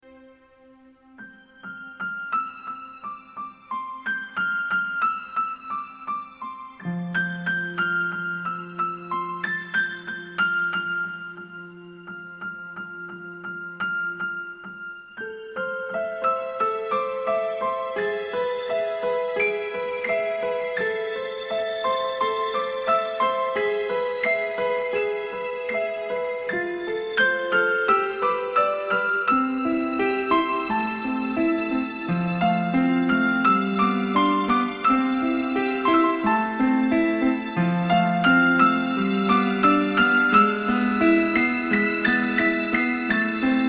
Zongora, könnyed; IMATARSOLY-ZENE

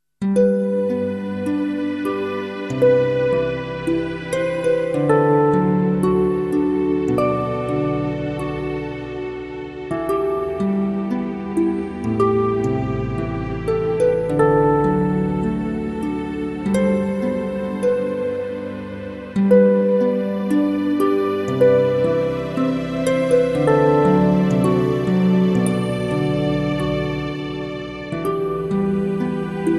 JINGEL; az Imatarsolyhoz; IMATARSOLY-ZENE

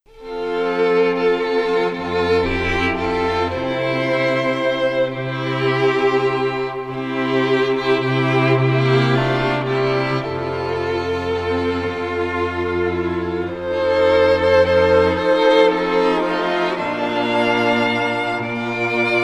Instrumentalis klasszikus; Susser die glocken nie klingen; Fillers

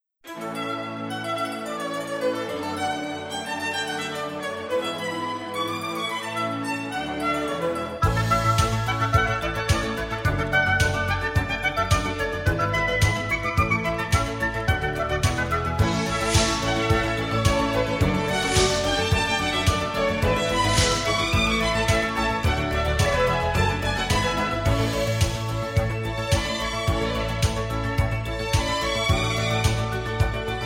alapszignal zeneje; Katekezis

